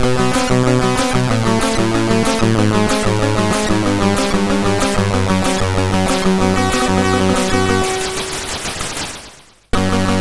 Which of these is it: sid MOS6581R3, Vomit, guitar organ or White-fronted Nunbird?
sid MOS6581R3